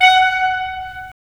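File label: wind clarinet; Roland - LA Composer vol. 3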